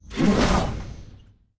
guardian damage, monster; legacy guardian

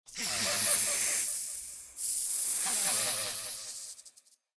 naga idle, monster

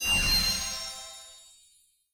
Slots Open; Myth

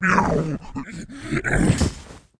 lancer death, monster

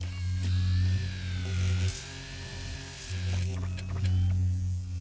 machine idle, monster

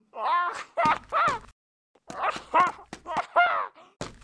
mask idle, monster

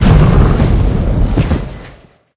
mech ape dead, monster

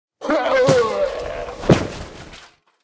durahan dead, monster

